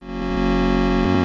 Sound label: Windows Shutdown; Windows Never Released Soundpack 2